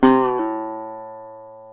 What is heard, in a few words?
GTR, Dobros, National; Club-50 - Foundations Roland